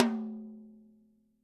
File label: T8 F L, TOMS; Living Drums; Sampleheads; Peter Erskine; PB KICK+TOMS